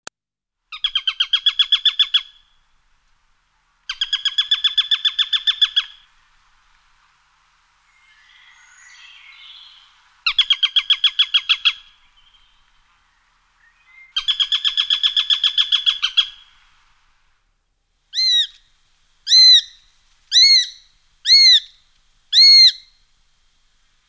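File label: perepelyatnik golos, sparrowhawk voice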